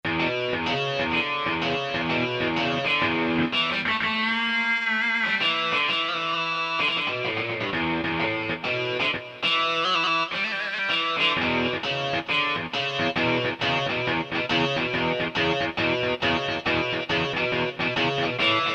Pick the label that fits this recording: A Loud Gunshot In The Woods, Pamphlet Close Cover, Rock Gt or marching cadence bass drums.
Rock Gt